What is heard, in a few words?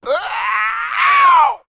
scream fake; ooh ahh